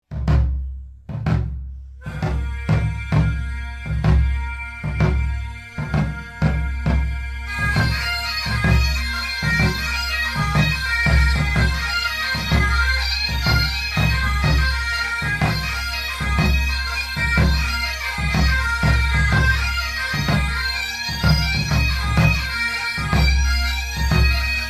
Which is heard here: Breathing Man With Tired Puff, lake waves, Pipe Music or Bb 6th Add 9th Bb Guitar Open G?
Pipe Music